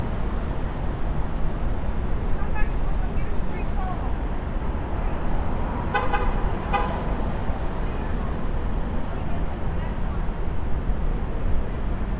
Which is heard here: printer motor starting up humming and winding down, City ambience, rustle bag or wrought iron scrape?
City ambience